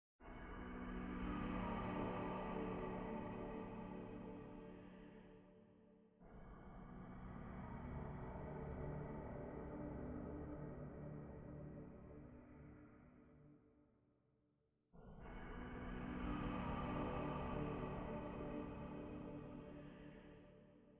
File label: Whisper Pad; Engine9; Frozen Flame; windows